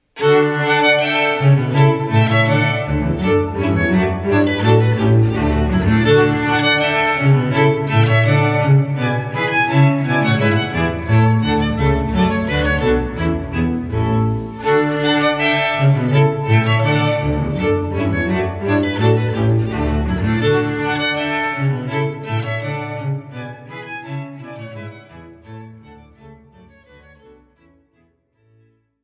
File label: bach air gavotte